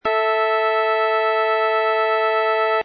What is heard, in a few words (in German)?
Quinte, Kirnberger Stimmung